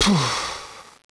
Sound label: moaning sound; PanzerE; Desert; CD-Gamer 1999-10